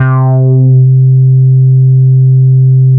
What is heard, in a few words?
FUZZ C2; E-MU Producer Series Vol. 6 – Analogia Project; Strictly Synthe